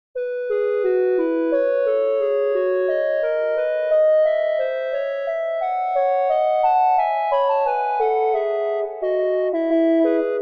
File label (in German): Klarinetten-Duett; instruments